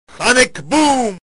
sonic boom sound effect

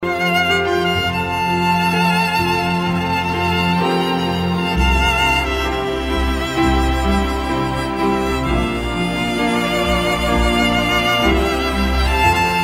violin ringtone

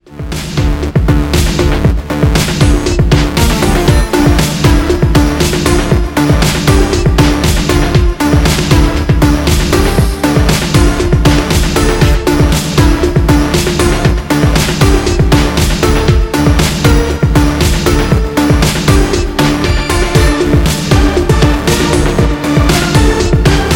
night time ringtone

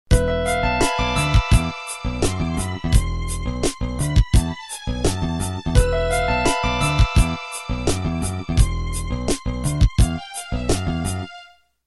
motorola spooky ringtone

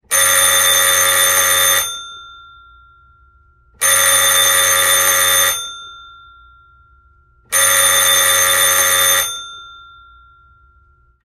phone ringing

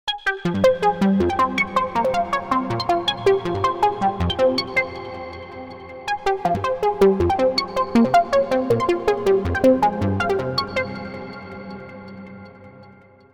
ambient space music

